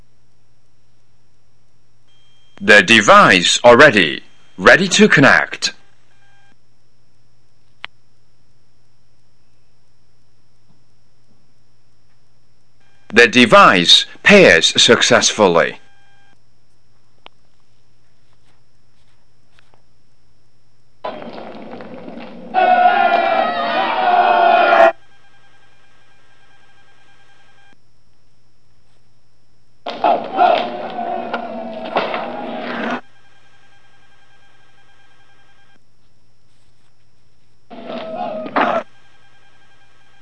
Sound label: startup and pitch, Bluetooth Speaker; Modsynergy; DGZHE A06